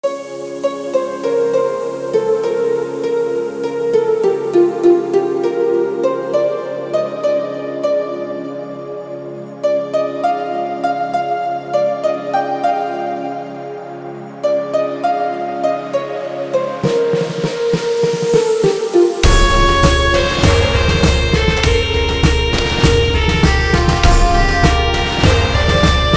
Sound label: Bhakti Mashup Remix; Happy Independence Day